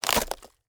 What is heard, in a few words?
Wood Impacts, Cracks Crunches; Red Libraries